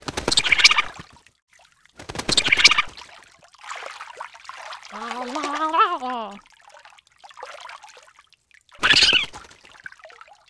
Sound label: Penguin Idle